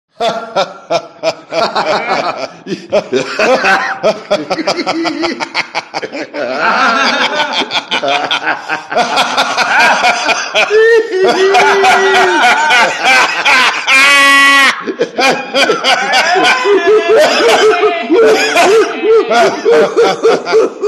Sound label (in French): rire